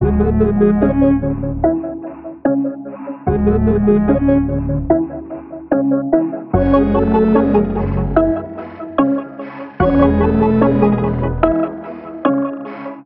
147 Drippy Loop A

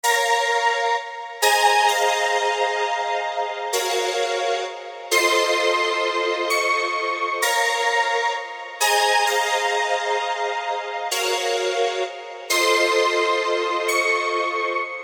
Drop chords

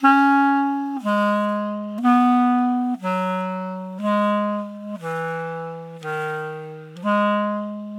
120 Eb Heavy Clarinet Solo

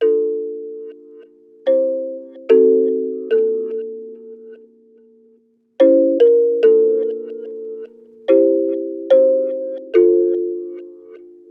145 kalimba chords Fmaj; pearl

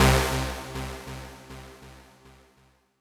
Wide Stab C2

